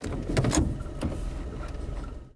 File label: Car Handle Door Glove Department Switch Misc; Nova Sound